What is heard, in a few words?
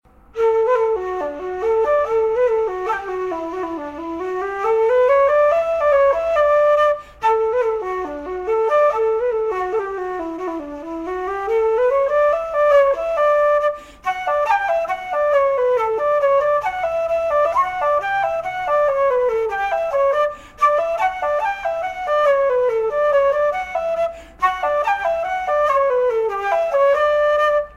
Polka